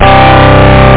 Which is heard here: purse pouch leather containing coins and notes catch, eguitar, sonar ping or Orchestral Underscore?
eguitar